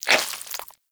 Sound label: Armadillo Tongue Move; Warframe; Characters; Hunting Critters